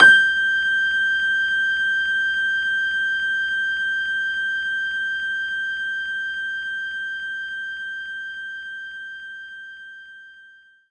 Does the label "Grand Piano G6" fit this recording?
Yes